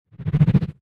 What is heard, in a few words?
robot die whoosh; fight